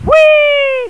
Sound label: whee